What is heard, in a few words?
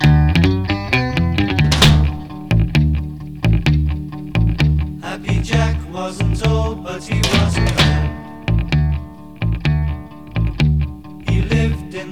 Alt Drum Version